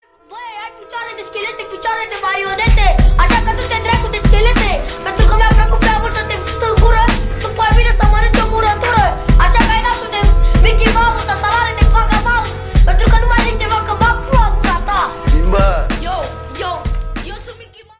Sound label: Urban Groove